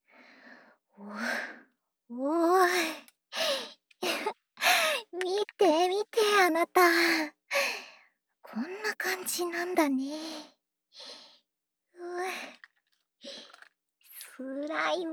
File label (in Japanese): スライム遊びASMR